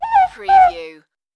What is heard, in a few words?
Morepork